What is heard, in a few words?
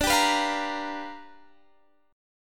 G 7th Sharp 9th D